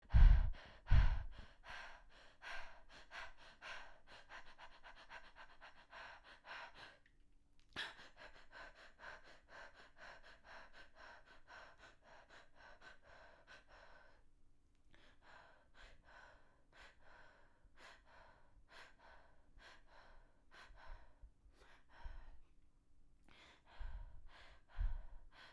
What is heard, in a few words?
Person Out Of Breath